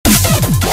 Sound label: Dubstep